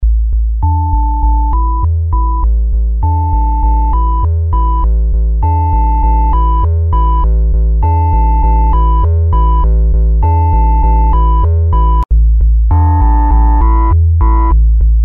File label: tube harmonics